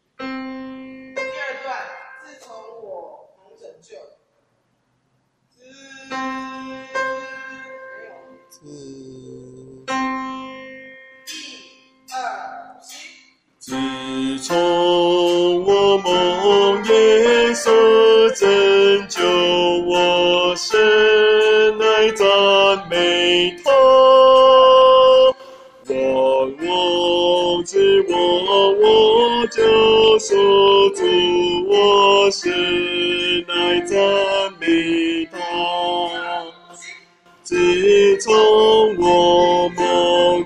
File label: bass